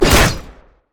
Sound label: bola strike; rengar